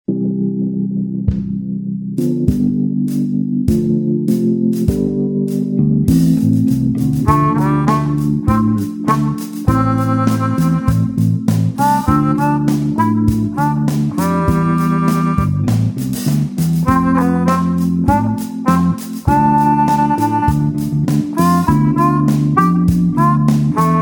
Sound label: beat versus offbeat, bluesharmonica